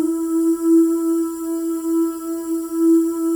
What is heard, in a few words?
OO, FEM OOS, Voices; Spectrasonics